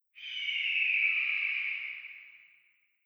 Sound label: Zion Hawk